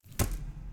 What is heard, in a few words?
main menu click